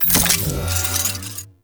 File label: Pod Shotgun Reload; Warframe; Weapons; Tenno